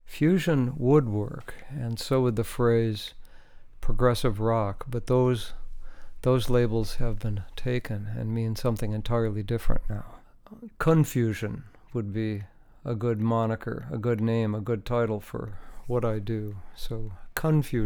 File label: Con fusion Drones